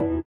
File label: SITAR; Spectrasonics; Metamorphosis; INDIATEK